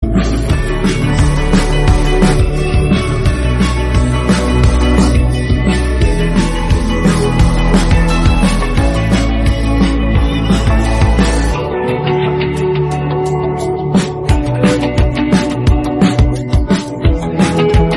INSTRUMENTAL RINGTONE

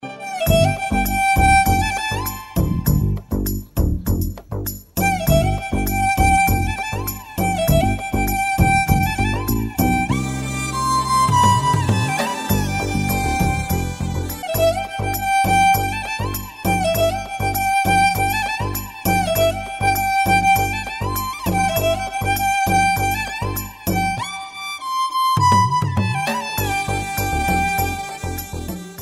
INSTRUMENTAL RINGTONE

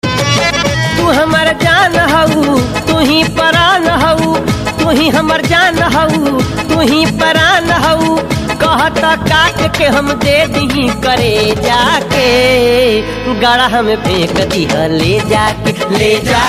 BHOJPURI RINGTONE

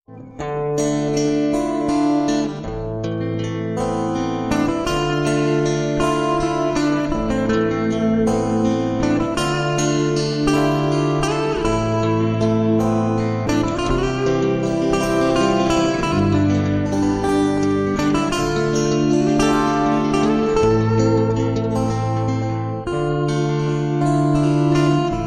guitar heaven ringtone

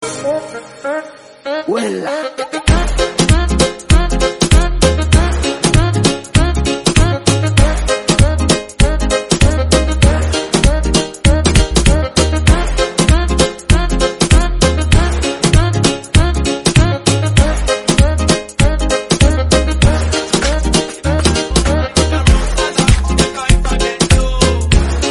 TAMPA CURHAT BEAT RINGTONE

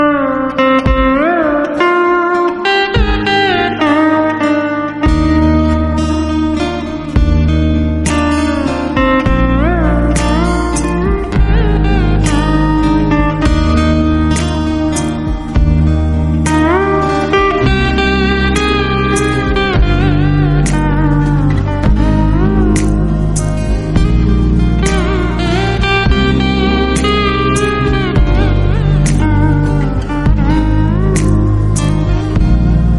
instrumental ringtone

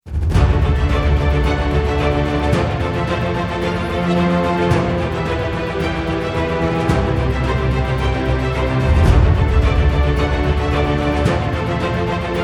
EPIC RINGTONE

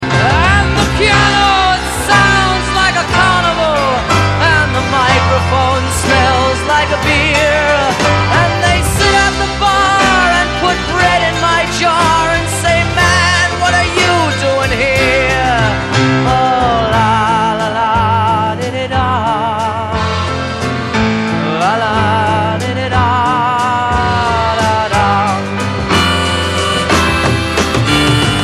piano ringtone

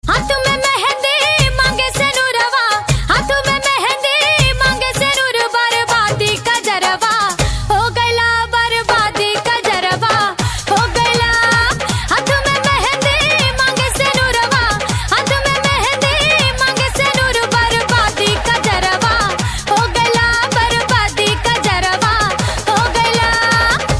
BHOJPURI RINGTONE

